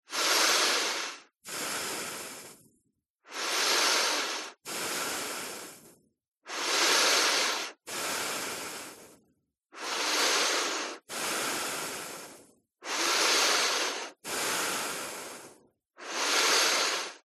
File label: human person breathing through nose close up